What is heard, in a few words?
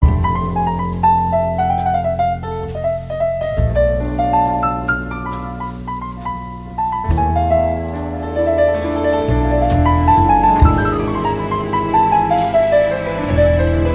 slick piano; Jazz